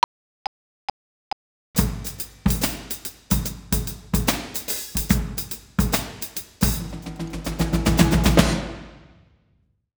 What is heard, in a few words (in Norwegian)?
Trommesolo